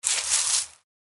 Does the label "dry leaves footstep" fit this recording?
Yes